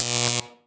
electro; diekrypta

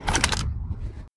reload push, weapons

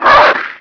small hurt; ghouls forest2; smalljumper

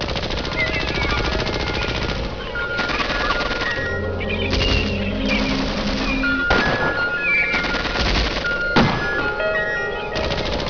gun city

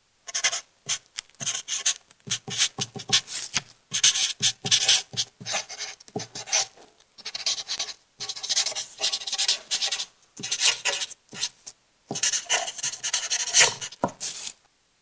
sick writing

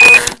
button click

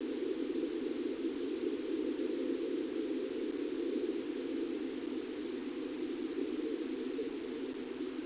dead wind

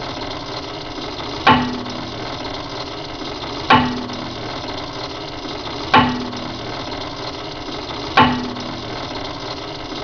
old machine

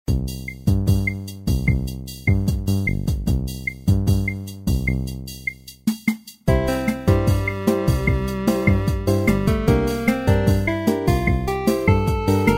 cheesy elevator, music; gamers-union

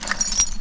key

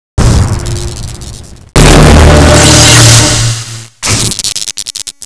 displacer reload

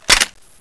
m4 catch

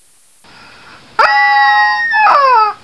chick attack strong, chicken

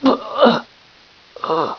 barn die

